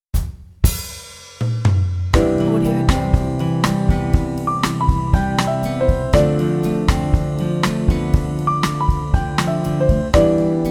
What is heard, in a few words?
bossa nova coffee